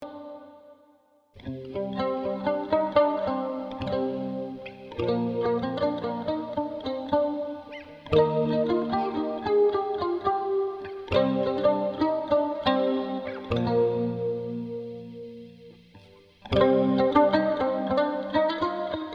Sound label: electric balalajka clean; berezovsky